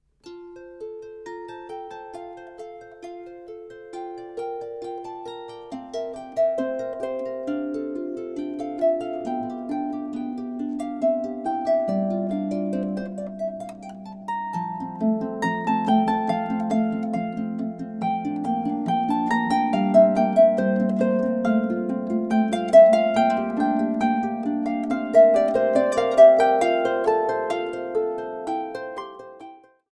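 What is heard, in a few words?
Rondo, Harfe; advent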